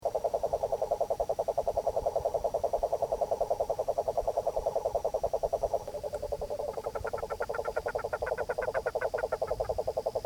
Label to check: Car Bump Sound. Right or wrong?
Wrong